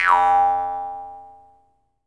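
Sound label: Jaw Harps, Pitched Percussion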